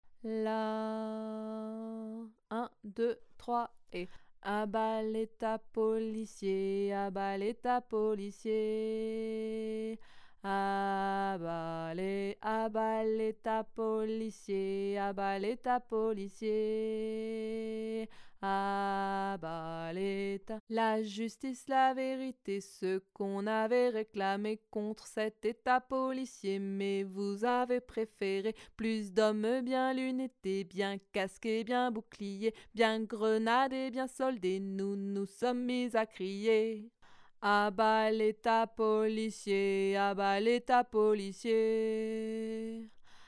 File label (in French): harmo grave